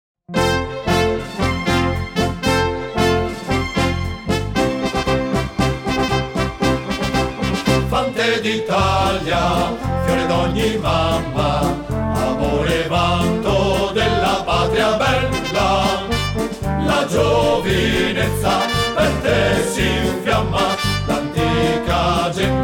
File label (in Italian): Inno al Fante Coro